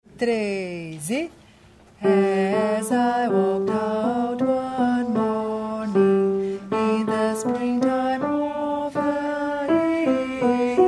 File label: Spring time tenores; coral